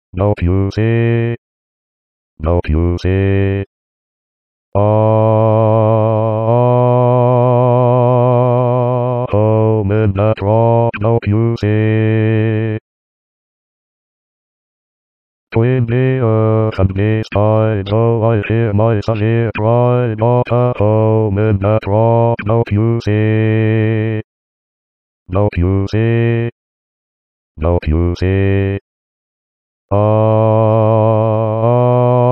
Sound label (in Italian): baritoni, Coro musica afroamericana, spirituals; I Got a Home in Dat Rock